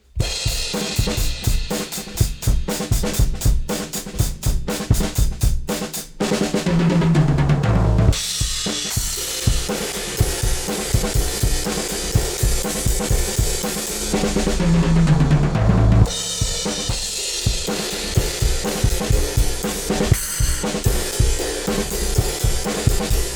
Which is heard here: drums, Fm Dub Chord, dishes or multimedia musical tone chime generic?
drums